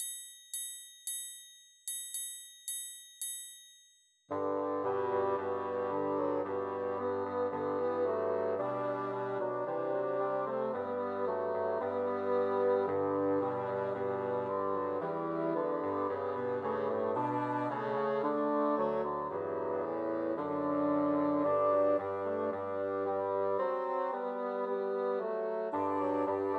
Hark THe HErald Angels Sing Christmas Trios for Three Bassoons